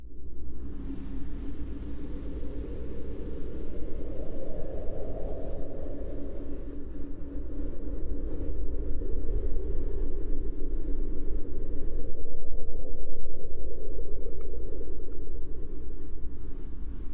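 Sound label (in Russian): гитарные мелодии струна a с гармонайзером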